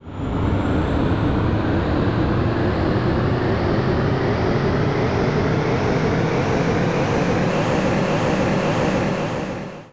jetplane

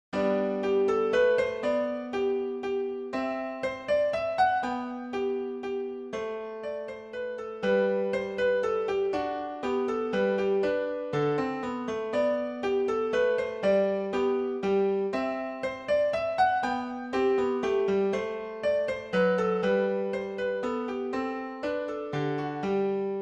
menuet

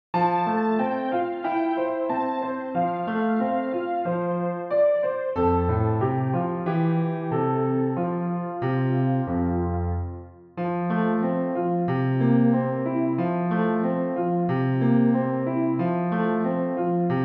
momiji karaoke; Classic; nippon

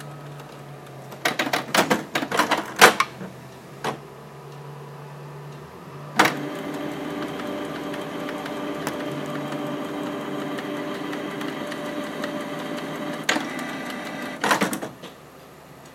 printer

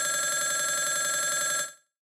telephone

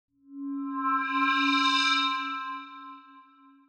ghost

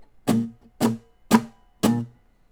hetappi G6, guitar

